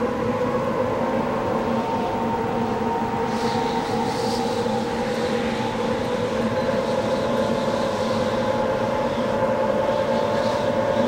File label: Mountain Stair Wind Tunnel Loop; Journey